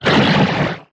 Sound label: strafer warcry big, enemies; Factorio